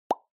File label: Onoma Pop Mid Dry